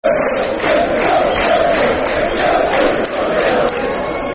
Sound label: Super Tom, Football Chant (Soccer Song); Rochdale